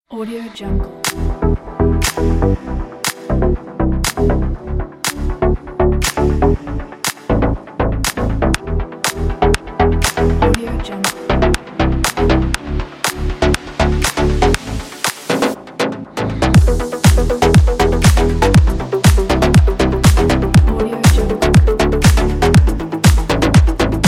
modern fashion house

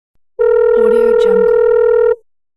telephone speaker ringing calling tone

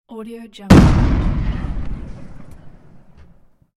cannon shot

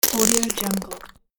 soft farting

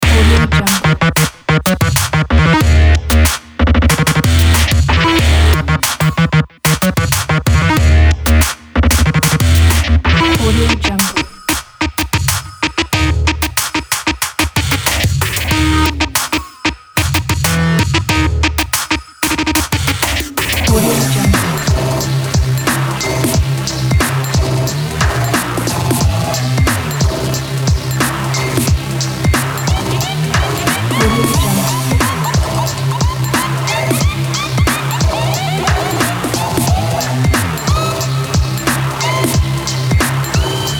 experimental hiphop loop pack

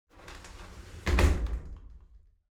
object bathroom shower slide doors close soft

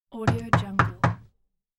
door knocking

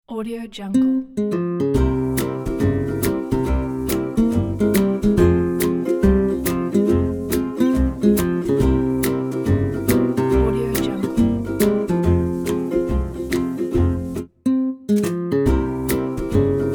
romantic comedy jazz

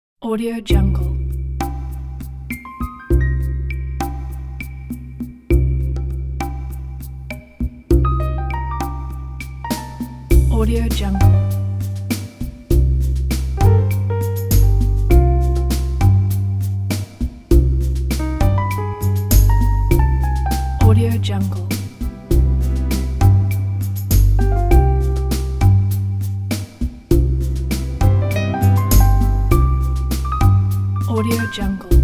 lounge

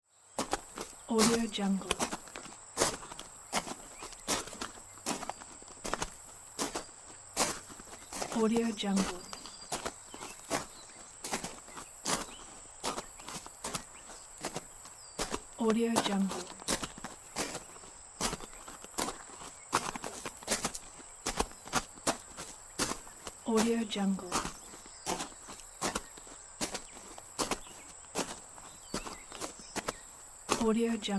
walking on gravel; cat track

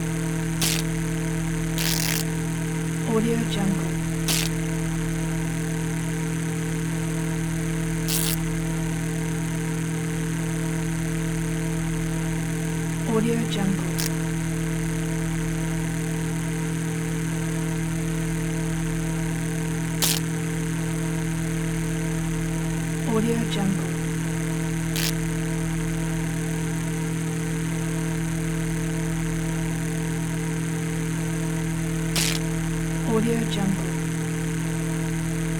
electric fence buzz; prosoundfx

